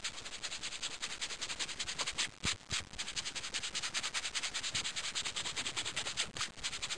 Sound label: Sanding Wood